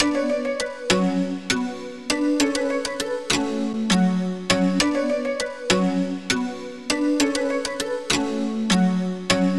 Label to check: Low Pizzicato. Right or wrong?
Right